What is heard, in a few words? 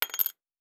Coins; Survival Game Sounds - Lite Edition; Objects and Items